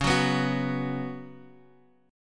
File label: Db 7th Suspended 4th Db down Guitar Half Step Down